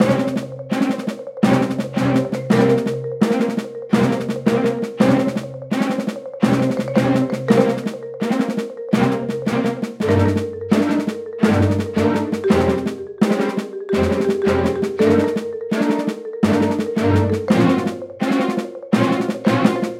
Ambushed 168bpm; BATTLE-NORMAL LOOP; Cinematic; SAGA Complete Collection v2.0; SAGA III - Cinematic Normal & Boss Battles

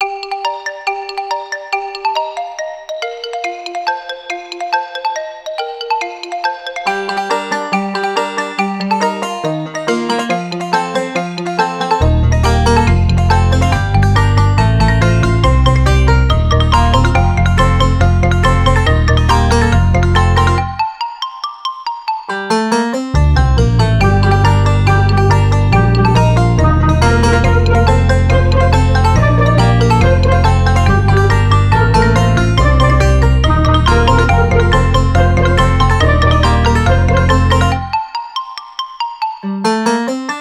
Tribe; Chronicles of the illusion world; Dark Fantasy Studio

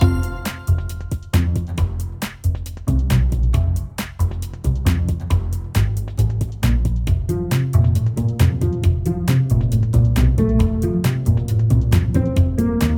MINI GAME LOOP 136bpm; SAGA Complete Collection; SAGA V - Towns, Shops, & Mini-Games

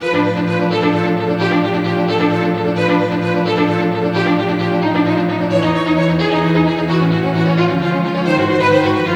Tension Builder (Orchestral 131bpm); Ultimate Everything Music Bundle; Loops for All Occasions

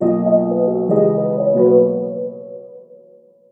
Satisfying Ending, Modern, 68bpm; Ultimate Everything Music Bundle; Stingers, Blips and Dings

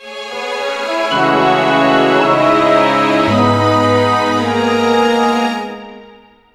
Sad Tragedy Orchestral 55bpm; Ultimate Everything Music Bundle; Stingers, Blips and Dings

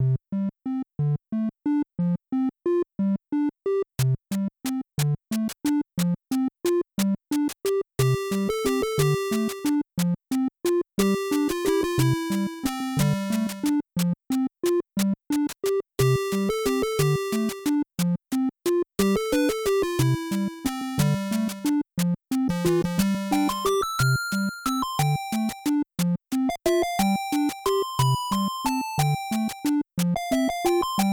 Underwater Race 90bpm; MINI-GAME LOOP; SAGA Complete Collection; SAGA V - Towns, Shops, & Mini-Games

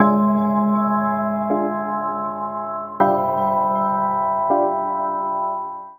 jingle careful long; Survival Metroidvania Vol1

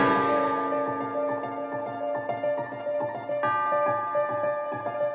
transition to loop, Cinematic Battle Music

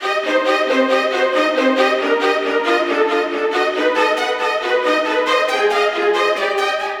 Tension Builder, Orchestral 137bpm; Ultimate Everything Music Bundle; Loops for All Occasions

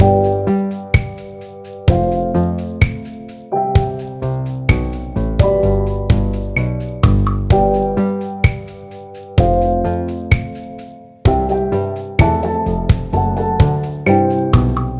Happy Menu or Exploration (modern, 128bpm); Ultimate Everything Music Bundle; Loops for All Occasions

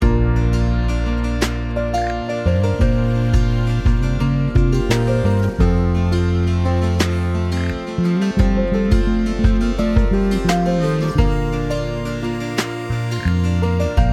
Unplugged Cotton Club; Unplugged Music Pack Vol. 4

